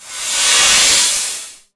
build sand fill

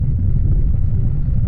disease outbreak

